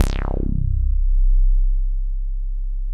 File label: BASS C2; JGL BASS; Jungle; USB Soundscan vol.05; Explosive Jungle; AKAI; 90s sample CD